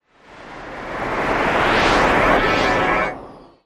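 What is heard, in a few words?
Digital Transfer Whoosh, stinger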